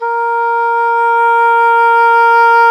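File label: english horn, swell; Roland L-CDX-03 Disk 1; Eng Horn Swl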